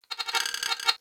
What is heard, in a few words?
Beep Stutter; Warframe; Corpus